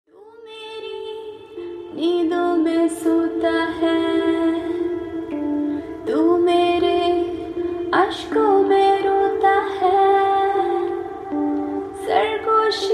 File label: Sad Version